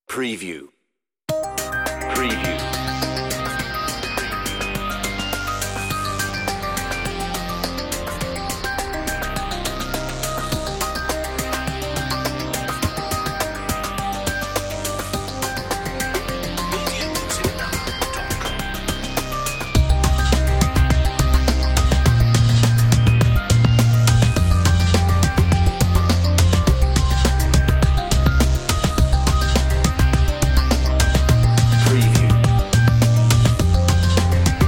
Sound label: Ambient Breaks, on-hold music